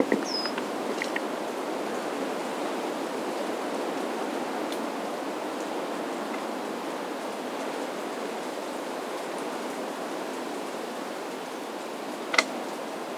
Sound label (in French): environement